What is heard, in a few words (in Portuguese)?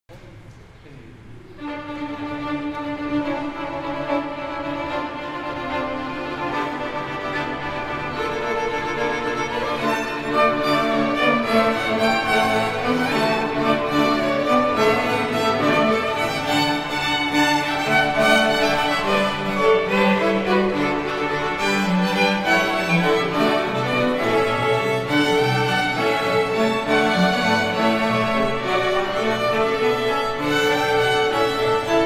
Coral; Coral CELSP